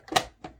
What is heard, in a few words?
tape play